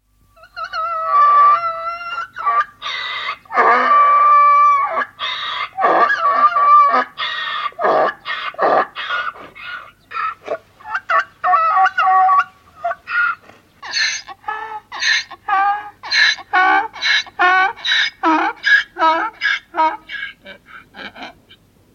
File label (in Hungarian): szamar, allatok; bbc